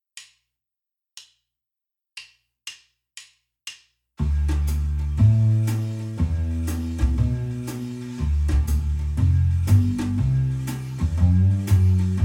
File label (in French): rythmique seule